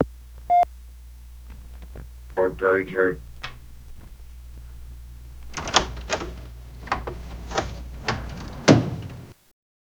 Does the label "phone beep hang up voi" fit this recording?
Yes